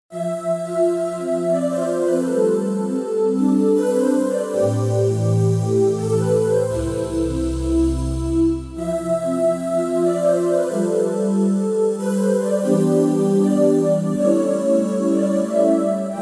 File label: Pvox Oooze; Factory Patches